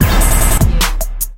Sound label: Turntable Scratching Beat Grid